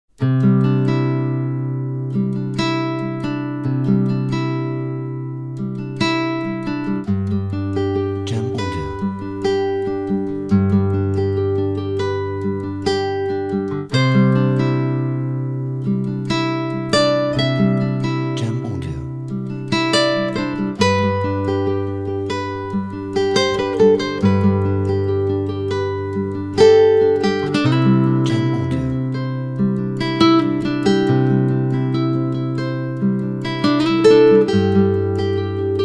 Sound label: Acoustic Guitar